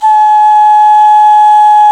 Clay Flute

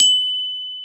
F#5 glock; InVision Interactive; Lightware VOL-1; Instruments & Percussions; GLOCKENSPIEL

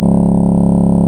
REED C#; Big Fish Audio; Synclav FM

